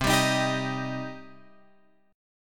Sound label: C Minor C down Guitar Open F; strummed; JGuitar